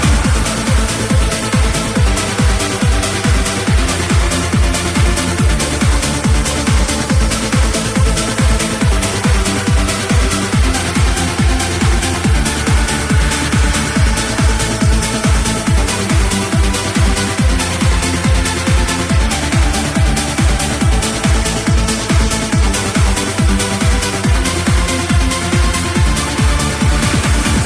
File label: trance track; svencoop; keenhalloween